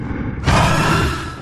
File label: roar; huggy wuggy